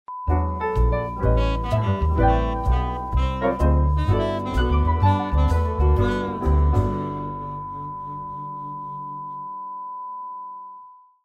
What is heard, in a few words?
groovy jingles